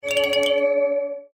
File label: magic ring